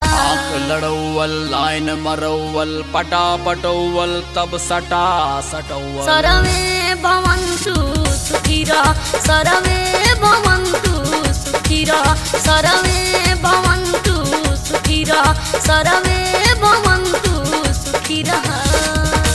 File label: Lattest Bhajan